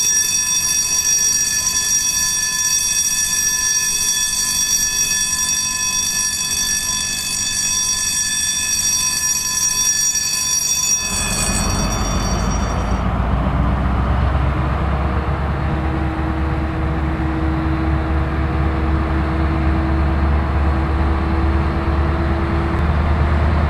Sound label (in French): Train de fret